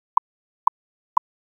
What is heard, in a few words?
1kHz bursts 500ms